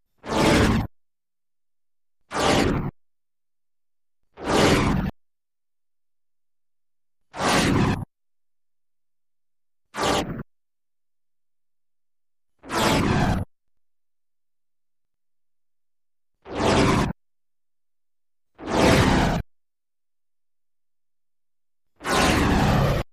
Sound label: Debris Flybys Fast